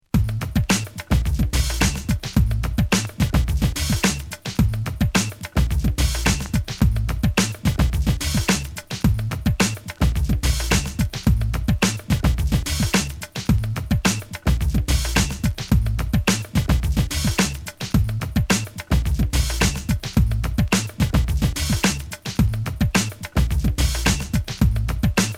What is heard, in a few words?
mix, bpm 108; wicked mix vol 019